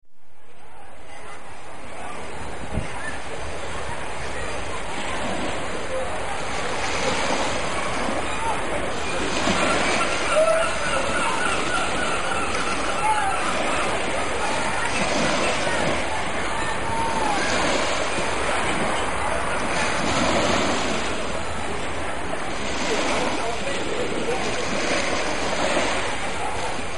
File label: Waves gulls & people; beach